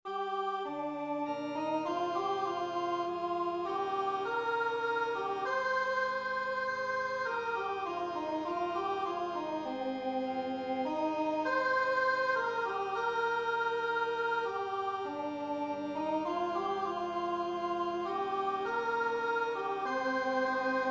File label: Canticle organ; Canticle Colossians 1; ND organ